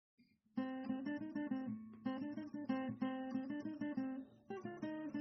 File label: Guitar; Baaghi 3